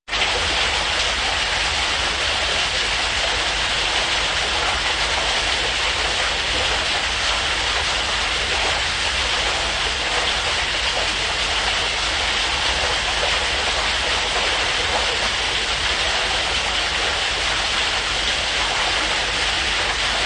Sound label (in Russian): Vodopad s prorabotkoy vody